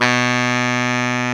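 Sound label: SAX Baritone Sax B 2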